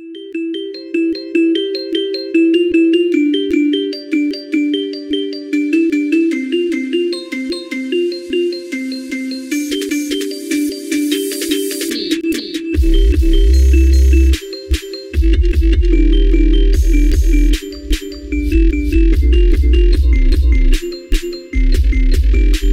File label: Xiaomi Poco M3 Ringtone